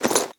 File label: armor heavy; smite; chiron